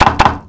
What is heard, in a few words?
sound refresh